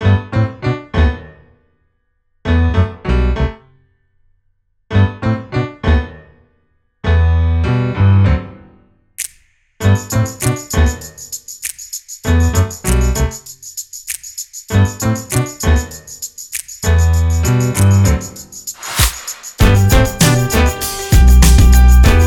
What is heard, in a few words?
Fun Driving Hip Hop